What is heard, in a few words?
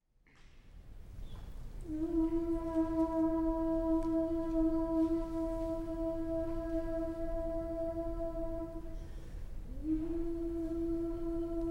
Haiku for Alto Flute